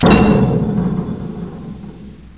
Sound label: door stop